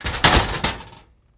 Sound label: Cabinet Metal Ems Ga